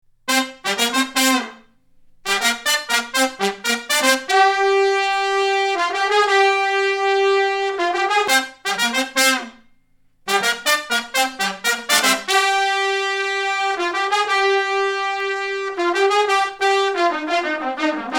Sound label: TF11 Horns